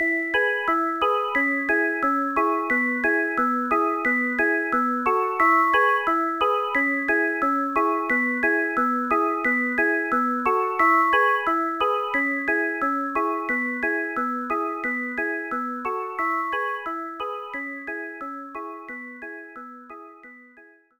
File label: Amb Merrygoround; Disney Friends; Nintendo DS